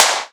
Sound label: studio steel snare drum F sharp key